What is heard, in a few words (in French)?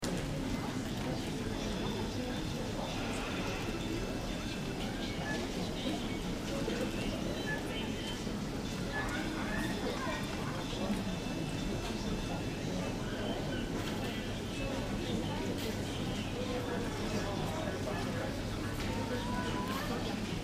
son d ambiance